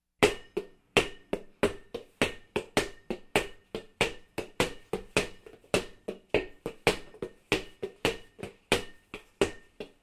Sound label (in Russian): Stuchat myachom